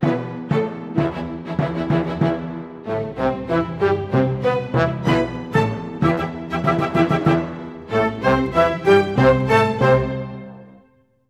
95 e min full mix timpani, Orchestral arrangements